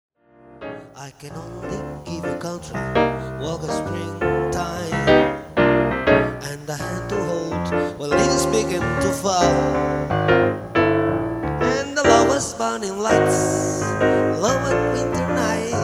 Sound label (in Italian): piano bar